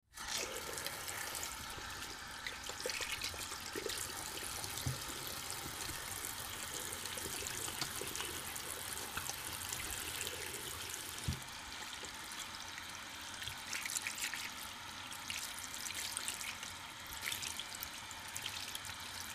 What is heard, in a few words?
MedicalScientific; Soundfx III